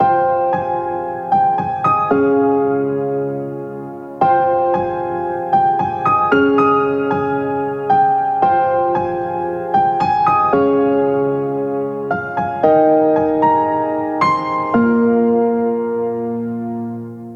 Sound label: Emotional Melody Heartbreak 114BPM, piano